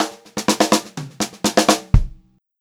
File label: Pop-Rock Drumloops